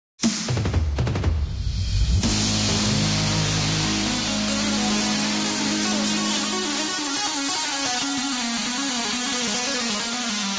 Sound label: Keytar; Zomboid; Lifestyle